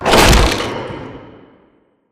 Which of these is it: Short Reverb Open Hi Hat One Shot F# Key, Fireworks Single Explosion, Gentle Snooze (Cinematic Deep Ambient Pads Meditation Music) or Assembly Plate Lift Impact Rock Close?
Assembly Plate Lift Impact Rock Close